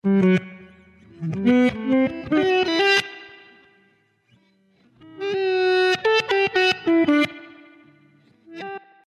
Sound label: Jazz Guitar; Ueberschall Jazz Guitar